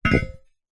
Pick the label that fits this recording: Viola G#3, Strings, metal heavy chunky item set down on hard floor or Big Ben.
metal heavy chunky item set down on hard floor